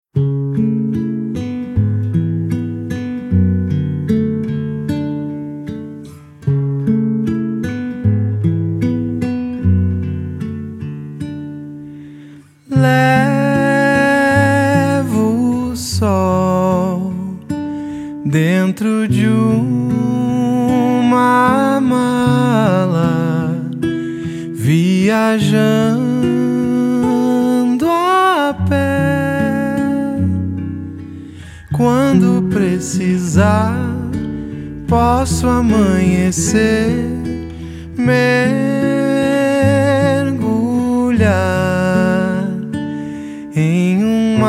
acustico

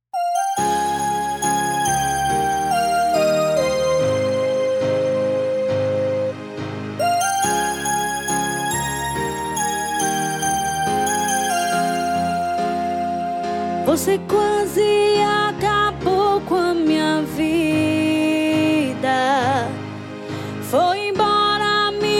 forro romantico

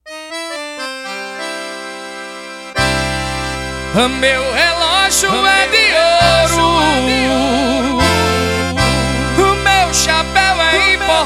toada abertura

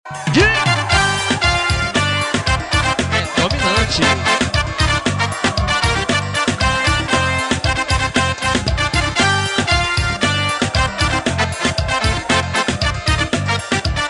forro

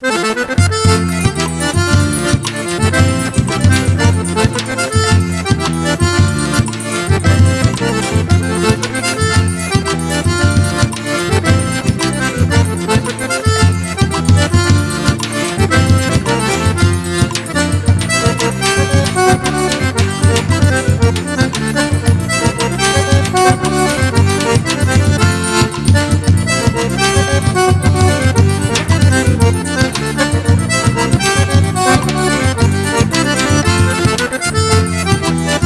Forro, Acordeon

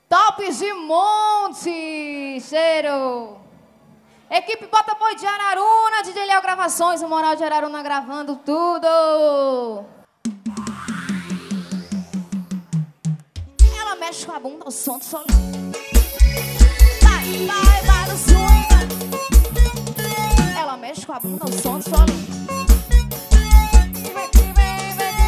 forro

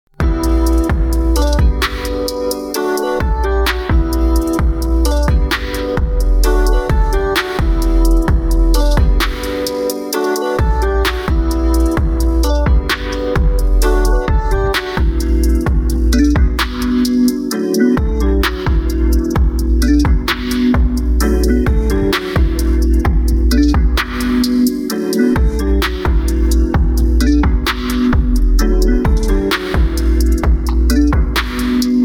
instrumental

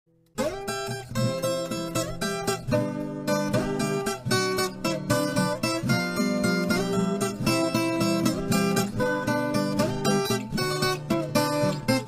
trilha instrumental de viola caipira

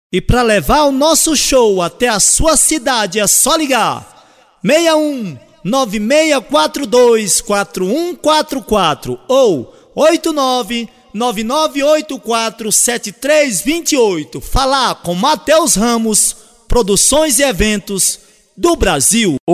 vinheta cigano

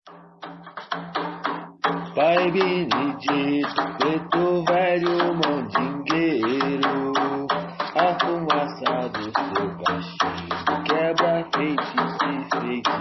ponto cantado de preto velho; pai benedito

